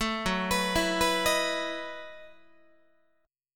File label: F sharp Minor 13th, Guitar; F#m13 arpeggiated; 12,14,14,14,15,14